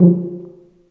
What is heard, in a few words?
TUBA C1, Solo Brass, stacc; Roland LCDP12